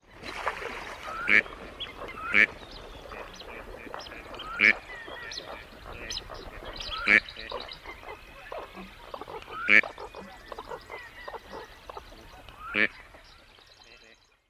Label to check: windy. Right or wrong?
Wrong